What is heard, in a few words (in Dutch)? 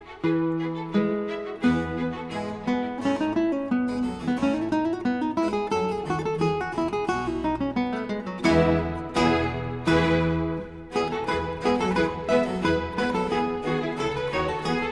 Concerto for violin (Gitaar No 2 in E major BWV 1042)